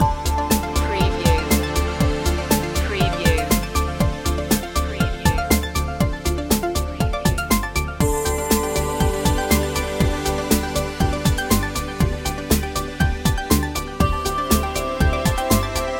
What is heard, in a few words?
analogica loop, chill